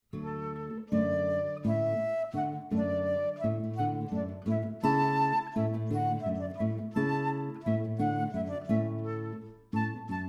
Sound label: Hornpipe